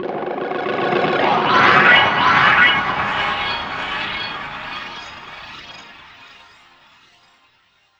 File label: TURBULENCE; Big Fish Audio - Alien Guitars; PEDAL TAP